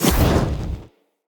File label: Casting Device Cast Left; Weapons; Player; Fenn; Casting Devices